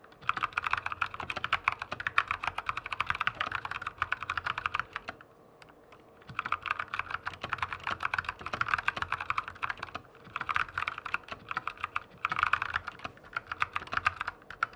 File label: typing